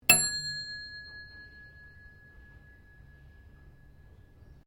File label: TELEPHONE OLD WALLPHONE BELL SINGLE RING; Various old telephones; Electronics